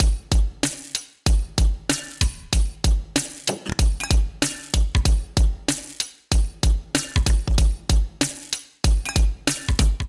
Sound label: Toy Hip Hop Kit [104 Bpm], Drums; MIDI Clips; Ableton; Factory Packs; Vibrations; ModeAudio